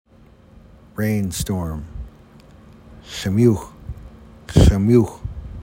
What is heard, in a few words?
Rainstorm